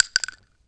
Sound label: shell